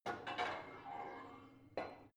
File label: barbell heavy plate put on soft, fitnessstudio; sport freizeit